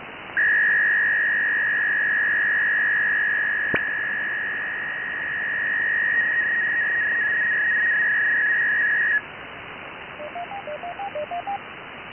Barrett HF radio sending a Beaconcall and receiving a "revertive" from the called party; CCIR 493-4 SELCALL